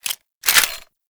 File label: Miter Reload; Tenno